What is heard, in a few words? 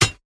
hatchet strike muted; rust